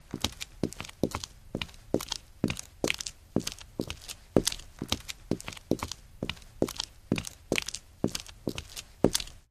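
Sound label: Footsteps Female Run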